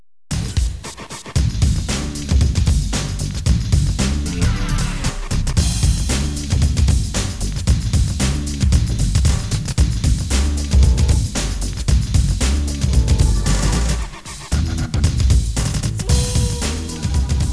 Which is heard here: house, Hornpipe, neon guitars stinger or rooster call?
house